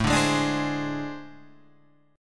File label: A Minor Major 7th Double Flat 5th, down